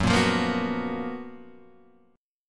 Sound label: F sharp Major 11th; F#M11 Chord {2 1 3 3 0 2} strummed